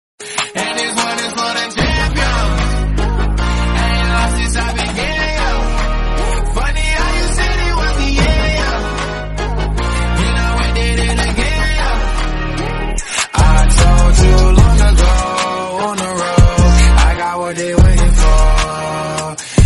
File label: REMIX RINGTONE